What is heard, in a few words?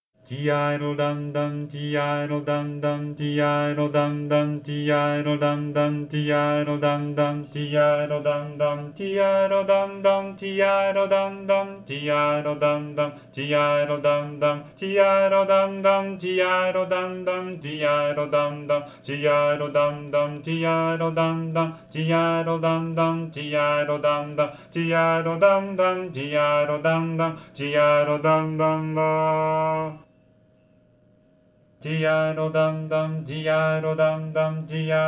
baritone; spring show